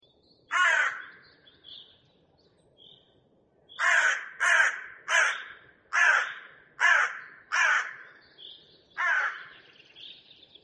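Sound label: crow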